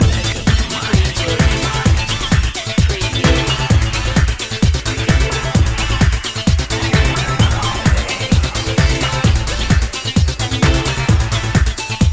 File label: thrillseeker loop